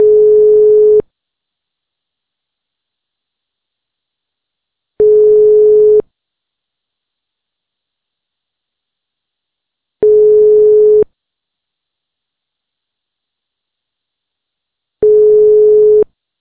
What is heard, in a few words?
ringing